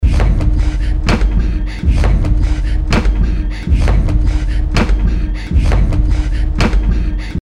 pulse breath loop

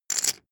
poker chip stack rattle

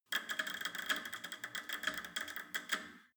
cable machine creak up short

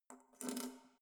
letterbox metal key pull out soft

